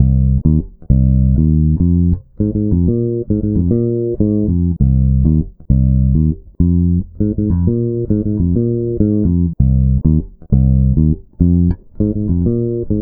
Bass, Electro Lounge; Sonic Foundry (Sony Creative Software); 100 Divide This

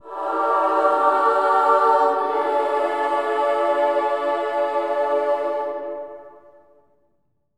amen; Spectrasonics; Symphony of Voices; BC AMENS

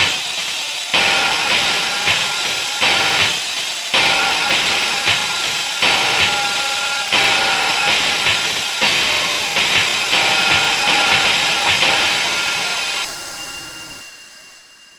Lo Fi 080, Lounge Drumz

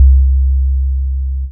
DM BAS, Dance Mega Synth

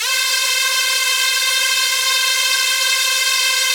SPACE STRINGS, Yamaha VP-1; Music Store

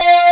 SYN, SYNTHESIZER; DIGI WAVE #1

